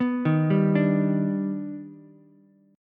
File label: D sharp Augmented Major 7th D sharp Ukulele; arpeggiated; JGuitar